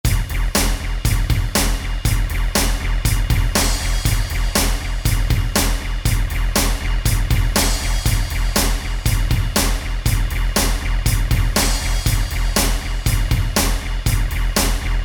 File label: Thrash